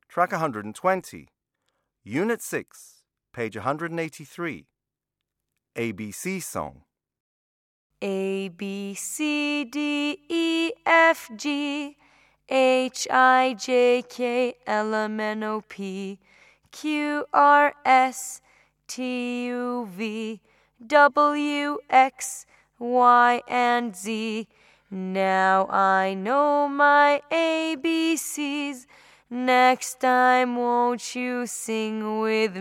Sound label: ABC Song